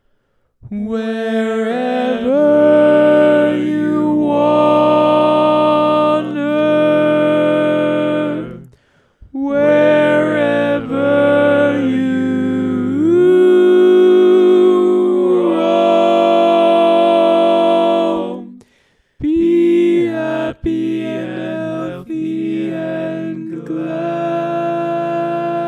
Tenor, barbershop

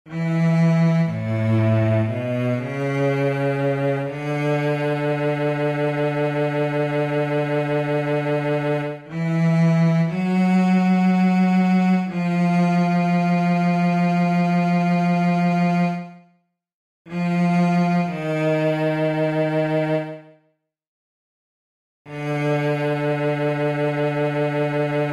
Bass, barbershop tag; Each recording below is part predominant - one part louder, other parts quieter.

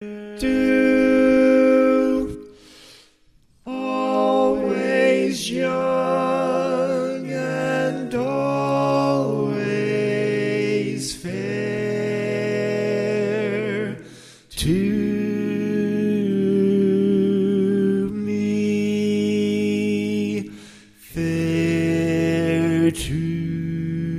Lead, Barbershop Tags

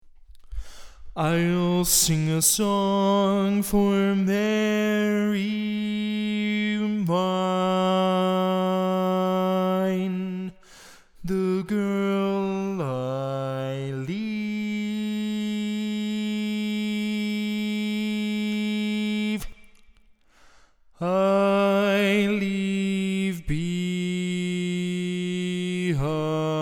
Bass, barbershop

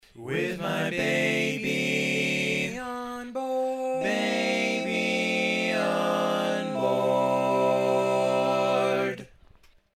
Lead, barbershop

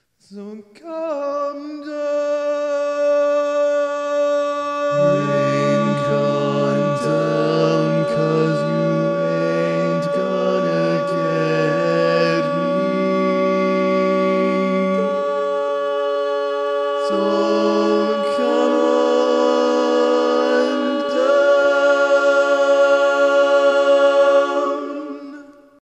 Tenor, Barbershop Tags

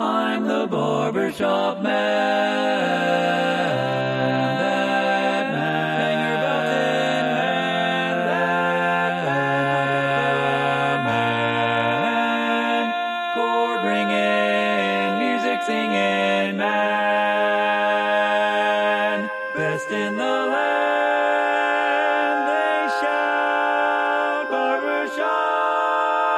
Bass, barbershop; TTBB version

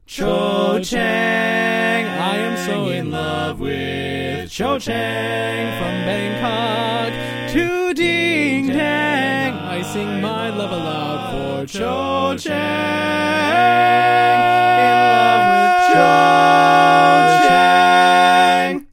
All Parts, barbershop tags

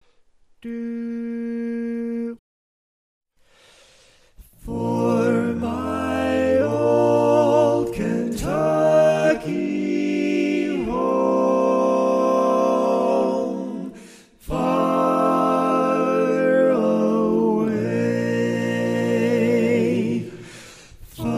Lead, Barbershop Tags, Soft version

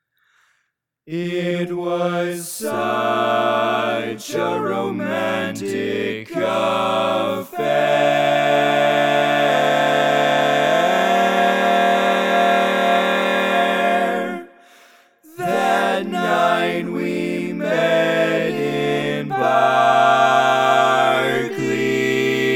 All Parts, barbershop

